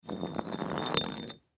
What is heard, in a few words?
Brick Drag Cement, Brick Drag Cement Light; foley; Dragging Sound Effects; Objects; Blastwave